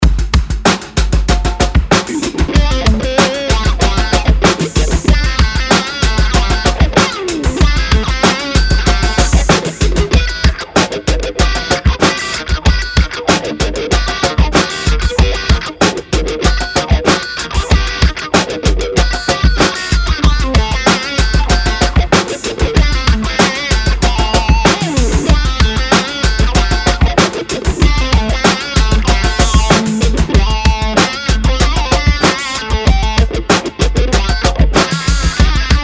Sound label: Strings Attached; 6 Strings Attached